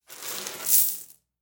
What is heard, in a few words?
Coins Collecting